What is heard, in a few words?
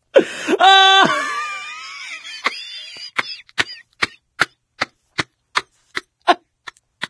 laughter, survivor; voice; source engine; left 4 dead 2